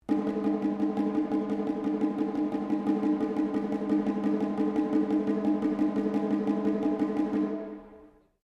Drum Taiko Shime Rope Sequence, Small Japanese Taiko drum; Musical; Miscellaneous Musical